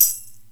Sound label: Tamburine, Zoom RT-234; 300 Drum Machines; Kit C